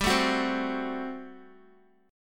G Major 7th Suspended 2nd Suspended 4th G down Guitar; GM7sus2sus4; strummed